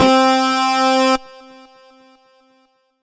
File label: Roland D 20 Metalized Dist C4